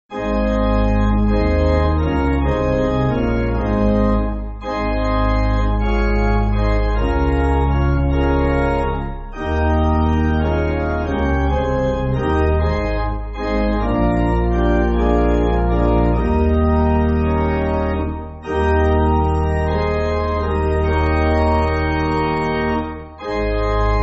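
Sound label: Pipe Organ; Now Think Over; Sankey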